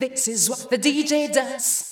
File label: VOICE GRL HOUSE 125, House Voice